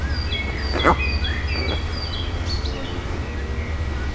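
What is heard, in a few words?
it made a sound, rook; pecsi zoo 2017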